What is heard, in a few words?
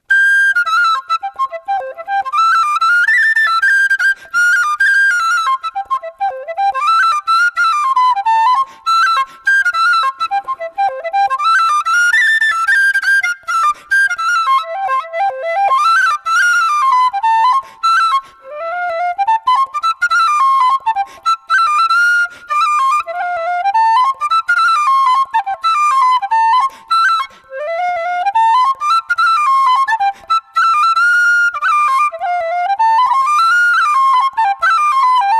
high c fast